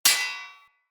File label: Short Sword Thrown Impact Armour